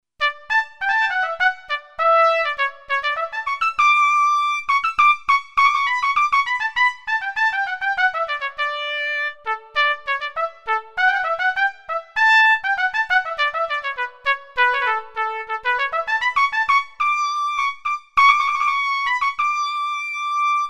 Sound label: Orch Trumpet